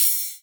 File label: Rides, Cymbals